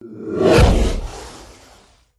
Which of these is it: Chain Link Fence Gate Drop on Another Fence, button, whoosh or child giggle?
whoosh